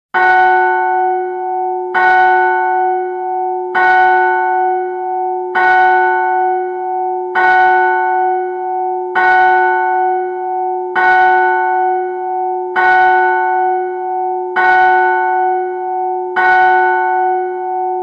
Church Bell Medium F, bell towers

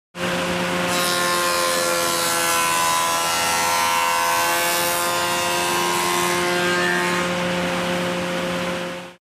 Industrial Saw, Large; Cutting Through Wood.

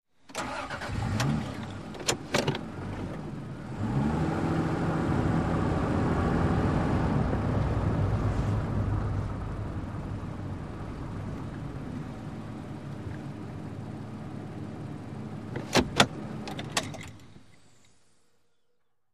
SUV Engine Accele

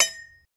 Bullet Shell Drop On, shell casings fall

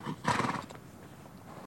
Horse Blow Generic

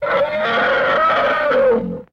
Elephant Squeal Roar

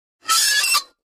Metal Scrape On Metal

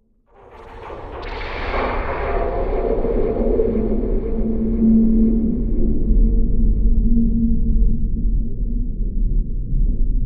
Jupiters Ring Springy T, deep

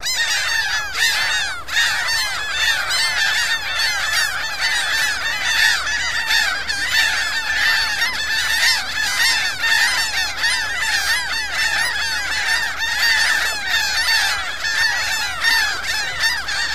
Seagulls Constant Ch

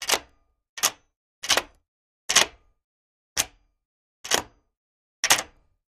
Keys, Lock, Unlock A Wood Door x7

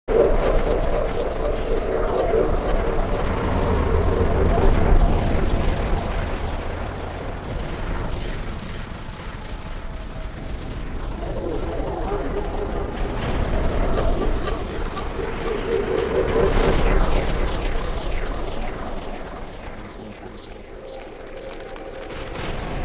Transmissions Mechani, communications

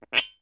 Cork Pops & Squeaks; Squeaks

